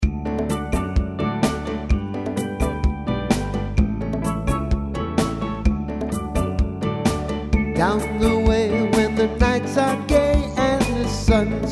Tropical - Calypso, guitar

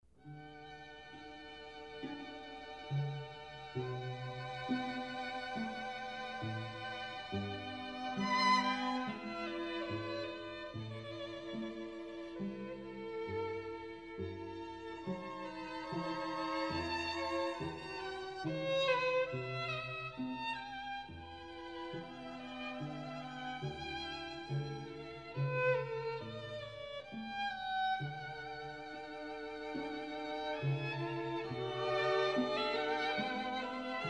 Classical Quartet; AIR ON THE G STRING; BACH

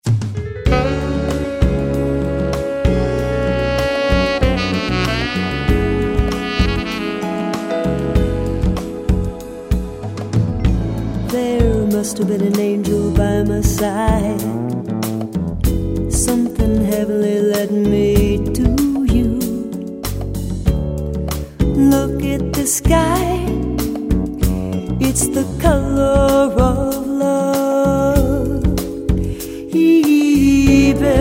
Vocal Smooth Jazz; Variety Band

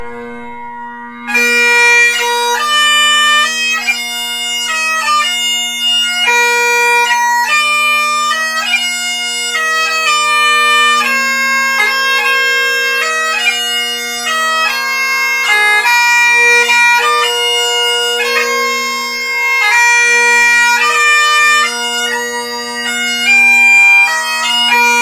bagpipes

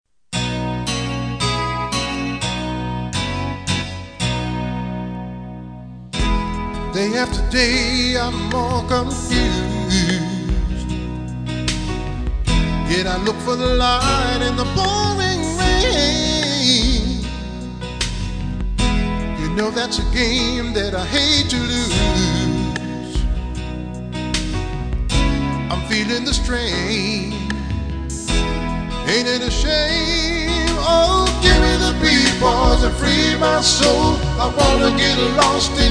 One Man Band

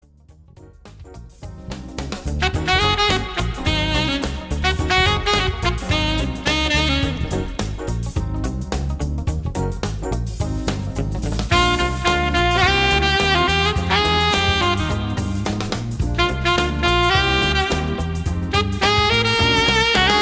Solo Sax

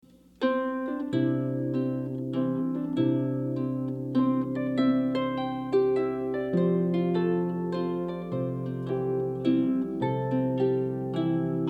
Waltz on Harp Recorder and percussion; Folk

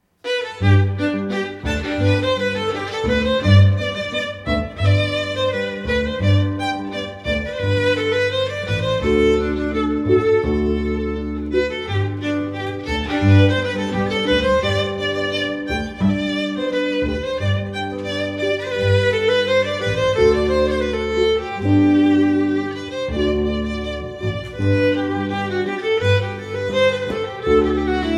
String Trio; Rondeau; J.-J. Mouret; Classical; International String Trio

